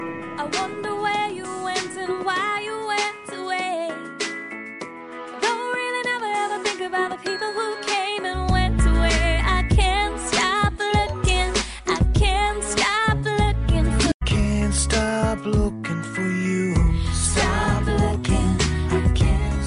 Sony Ericson W8

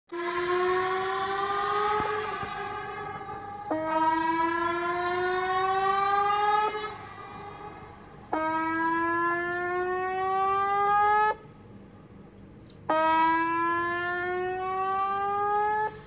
Creepy tornado siren

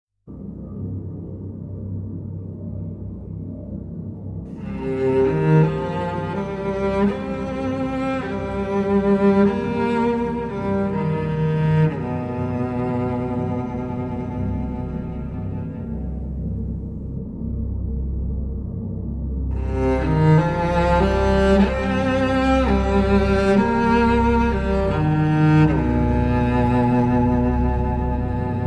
Violoncello Solo

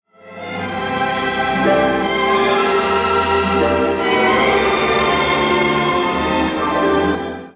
Classic Sms Htc

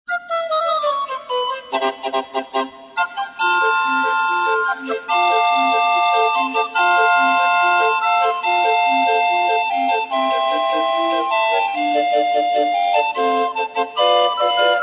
Circus Music

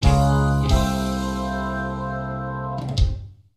Blues Calm

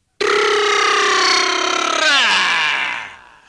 Baa

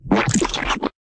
Cartoon Splat

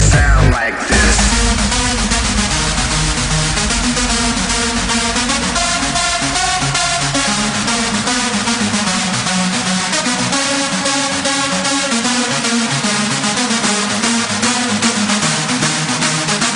Hardstyle Tune

